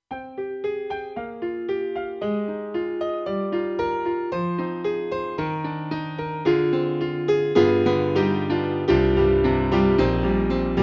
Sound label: SG01p MELLOW GRAND; AKAI